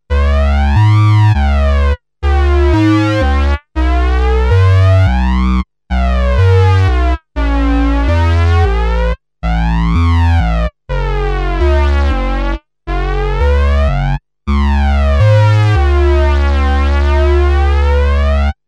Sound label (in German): Behringer Model D mit Mooer E Lady Flanger